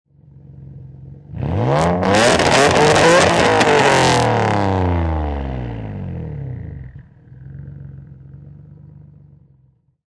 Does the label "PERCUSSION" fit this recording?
No